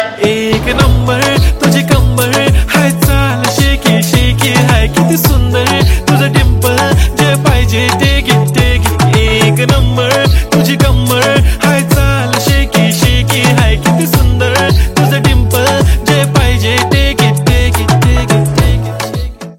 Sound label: Shaky ringtone